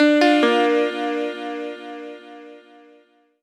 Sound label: gong; rozklad; zapowiedzi